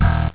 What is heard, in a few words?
bass snap